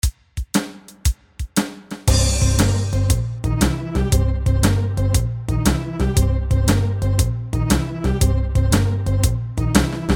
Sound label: Blues Backing